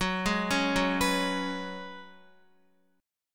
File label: F sharp Suspended 2nd Suspended 4th, Guitar; arpeggiated; JGuitar